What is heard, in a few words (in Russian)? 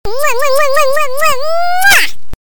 multjashnoe chmokane